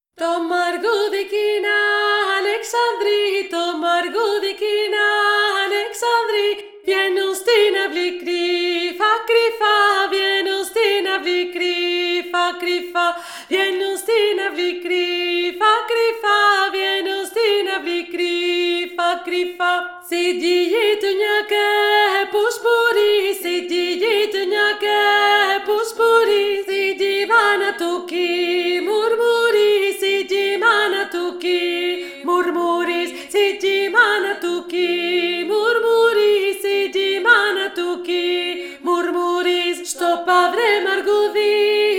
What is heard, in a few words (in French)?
VOIX 1; TO MARGOUDI